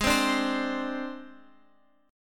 Ab Major Flat 5th Ab, Guitar Open F; strummed; x,x,8,7,5,7

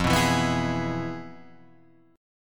F Minor Major 7th Flat 5th Guitar Drop D

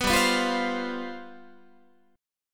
G 11th, Guitar; strummed

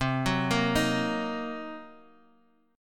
C Minor 7th Flat 5th, chord

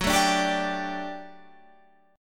G Major 7th Suspended 2nd Gb

